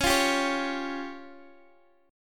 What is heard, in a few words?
C sharp Major Flat 5th; strummed; chord; x,x,11,10,8,9; Standard; JGuitar